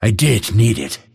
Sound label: lasthit pain; dota; phantom assassin